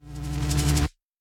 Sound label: Mech Dynamite WindUp; StyxMech Dynamite